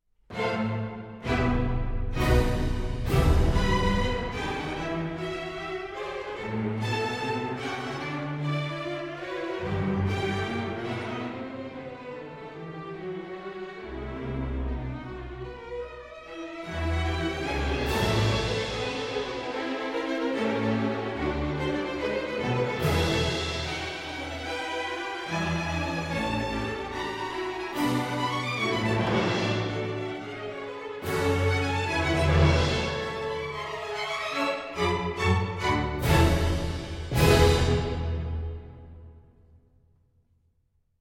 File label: Strings & Perc; The Avengers